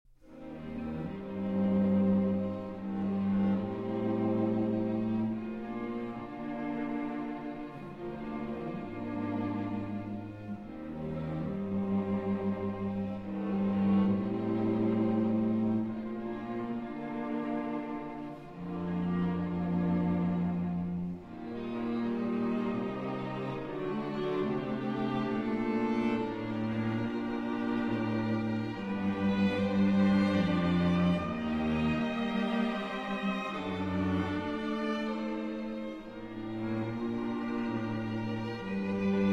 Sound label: String Quartet